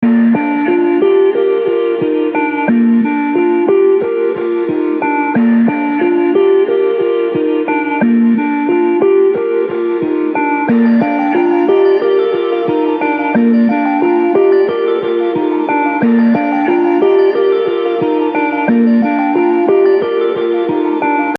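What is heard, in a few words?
90 B flat, samples; CRATES & BREAKS VOL.1 - BEEJ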